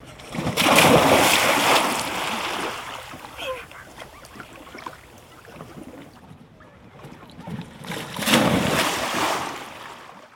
Splashes Big Jumping In Water Rock Down Slide, Manitoulin Island